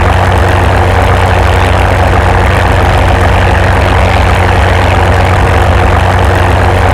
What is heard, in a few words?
Aircrafts Engines Packard Merlin Prop; DCS